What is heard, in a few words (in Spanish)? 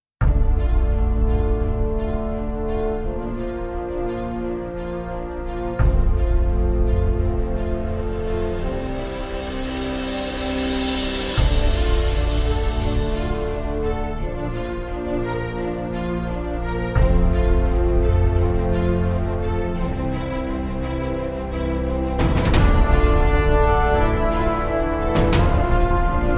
Musica epica de fondo para videos epica cinematografica accion inspiracional